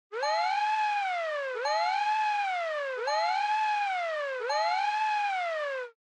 Fire Truck Siren Fake Siren

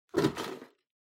Drawing Box, Hand In

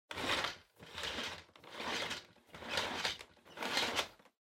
Vacuum Cleaner Cable Pull

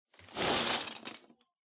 Loose Fill Packaging Hand Out; 梱包材

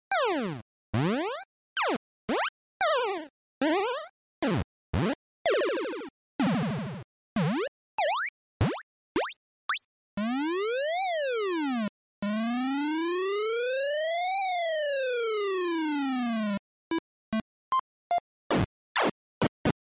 NES General

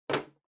Drawing Box Put

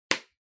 Pencil Case Close

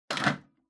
Public Phone Receiver Hang Up Mid

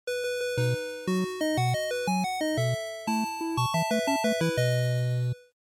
NES General Clear

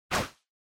Motion Agility Short

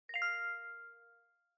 Smartphone UI Sound Speaker

